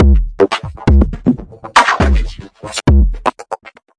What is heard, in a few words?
120 slap my glitch, drum loops and breaks